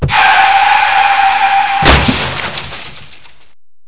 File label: Car Crash